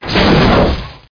Hit Hull

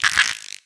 scarab hit; DAoC

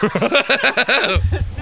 laugh

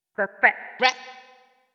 VOICE GRL HOUSE 125 A, House Voice

